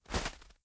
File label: Snow Foot Step; blue archive